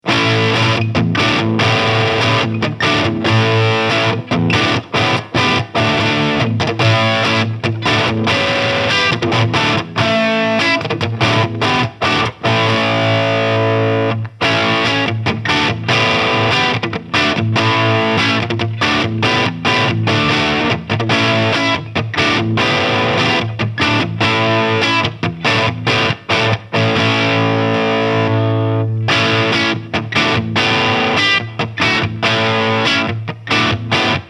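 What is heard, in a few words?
Epiphone Crunch, guitar; JC Bone White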